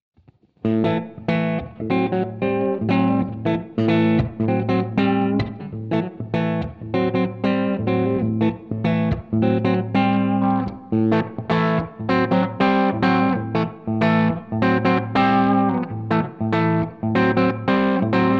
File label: ESP SC 20 Middle PU Bridge PU low gain